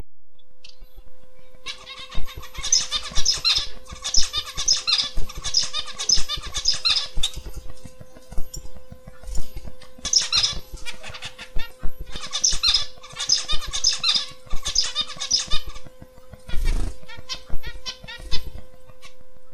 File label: zebra finch song